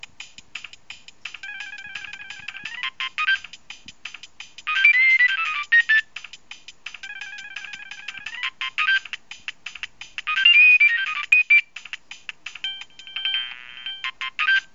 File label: nokia3510i running late ringtone